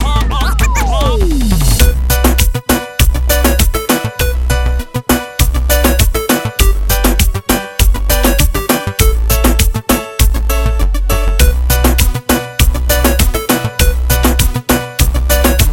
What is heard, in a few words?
Super reggaetone